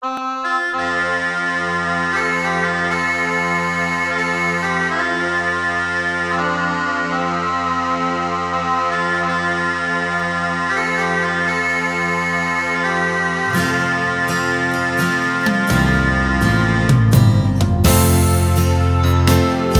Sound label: recorder